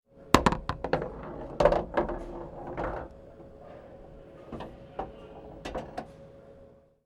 pool table ball return

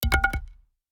wood bat falling in dirt

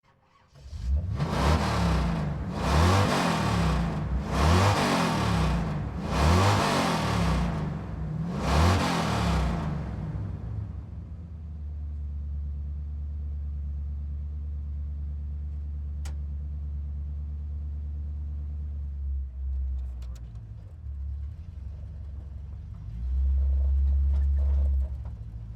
muscle car rev

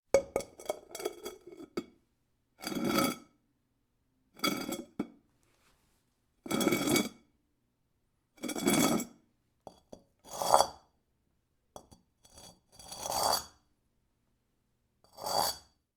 porcelain bowl drag series

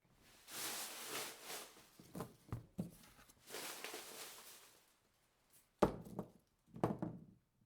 plastic crumpling

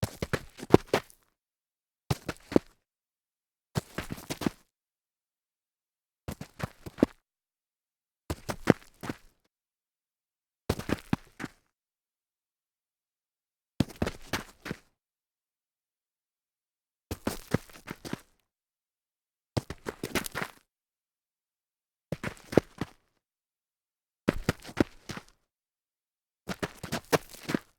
stumble grit

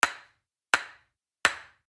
mallet on marble balls

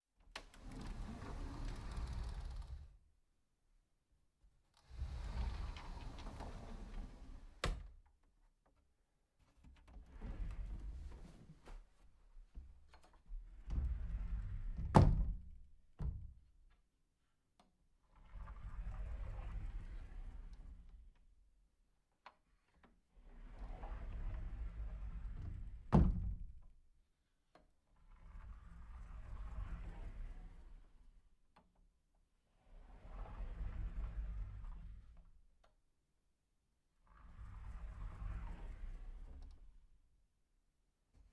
sliding closet door series

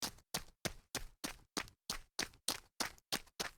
jog on grit

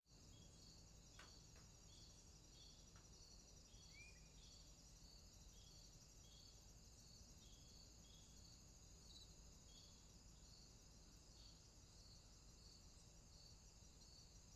warm afternoon outdoors

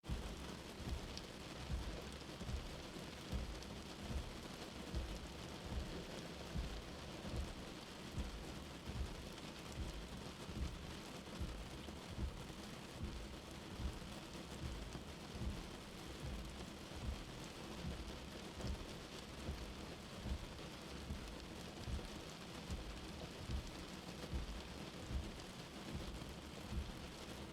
slow wipers in rain

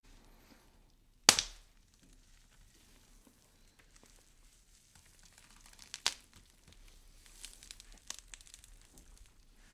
leg bone break and twist

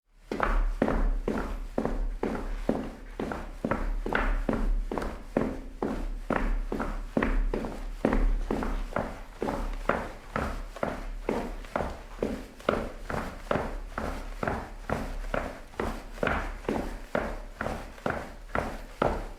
walking cleats

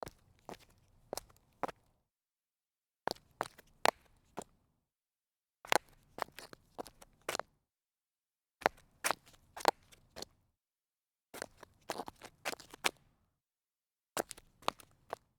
sidestep on concrete

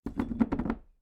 wood rolling short

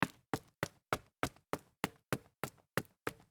dress shoes up stairs concrete

